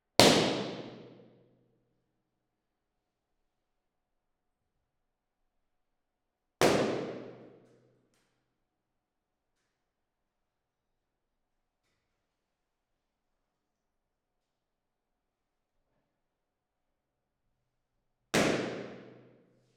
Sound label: Balloons; Nevaton; Omni